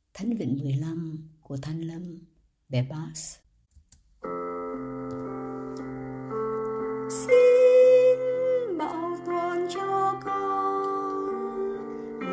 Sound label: Bass